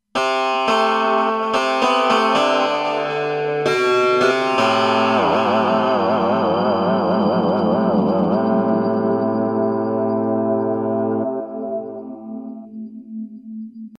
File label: E guitar